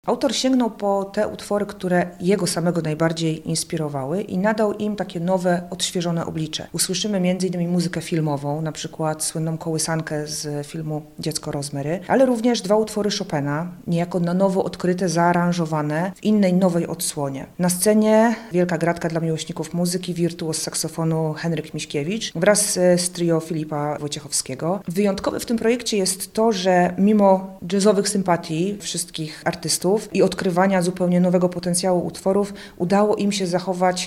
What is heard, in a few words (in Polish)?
klasyka i jazz